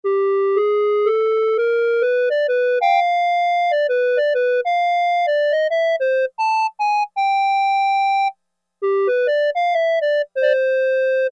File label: Reed, Roland Juno-60; Factory presets